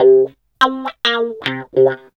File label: GTR